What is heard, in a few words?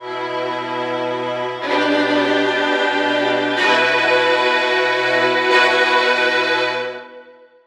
Disco Fever